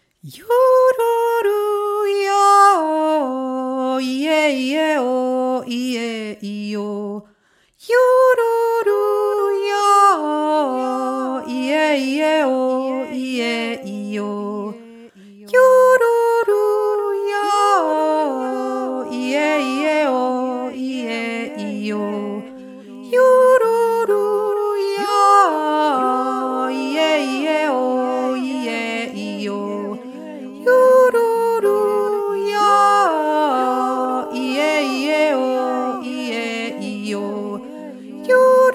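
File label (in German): Pygmäenjodler 1 Stimme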